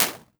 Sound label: reverb